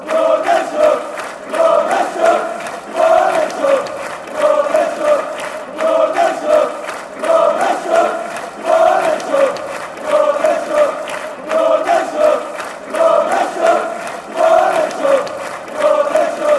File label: aldershot, chants